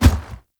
Heavy Grunt Boot Walk Gravel, footsteps; Characters; Enemy; Sinecure

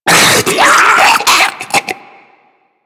Infested Diseased Ancient Charge; Warframe

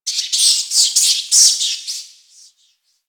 Forest Distant Medium Creature Small Cranky; Warframe; Grineer Forest

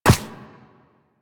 Walk Ice, footsteps; Warframe; Operator

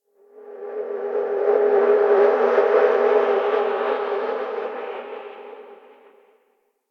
Exterior Drifting Energy; Ambience; Warframe; Railjack

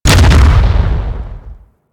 Step Metal; Warframe; Teralyst; Ropalolyst; Steps

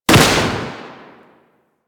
Sapient Pistol Shot; Warframe

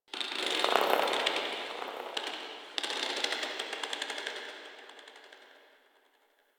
Covered Vine Area Creak; Ambience; Misc Outdoor; DE; Warframe; Infested Eris

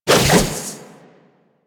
Dodge Whoosh; Warframe; Wraith

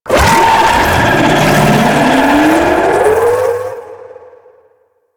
Raptor Laser Barrage Charge; Warframe

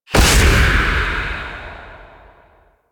Reaper Soul Harvest Target Hit; Warframe; Wraith

